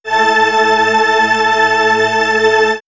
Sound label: Swirls String Stack, pads; X-Static Goldmine